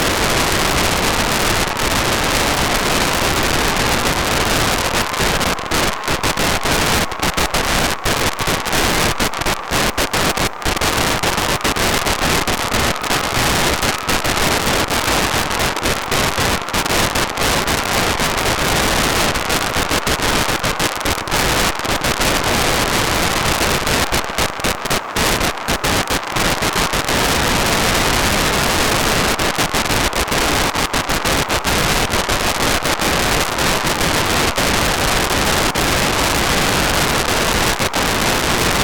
cw bake; vo-52 hamsat

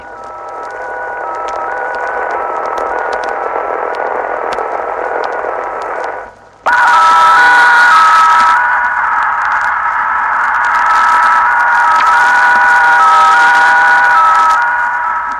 Explorer 3 sound; Von Braun

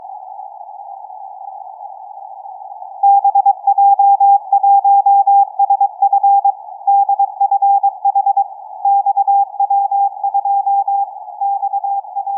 XW2E-CAS3E-VERT-01 cw

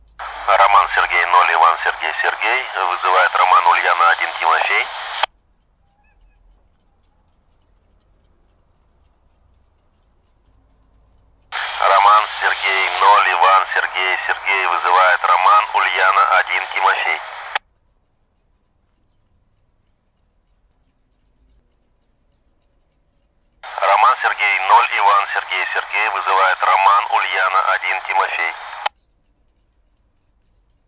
ISS 145MHz800

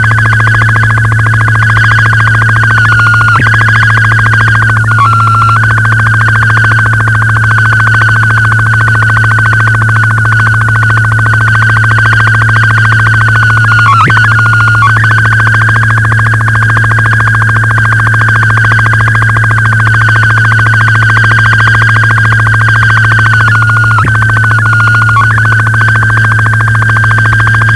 Viking Lander Picture Transmission; 1976

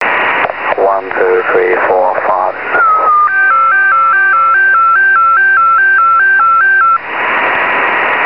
test of leila in ssb

